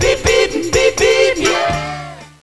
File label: Beatle Beep Long Tamborine